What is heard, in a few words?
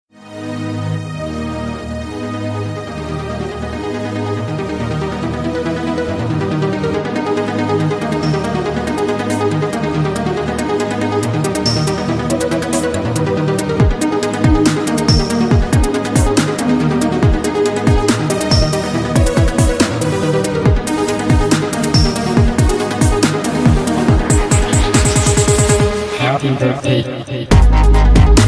happy bday dubstep